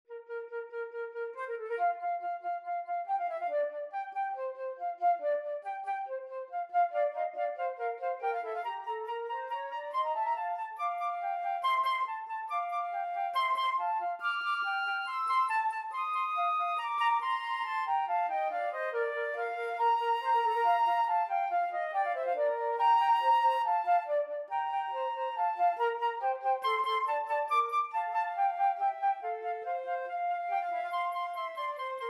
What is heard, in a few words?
Magic Flute Overture, flute duet